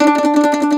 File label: MAND TRM, Mandolin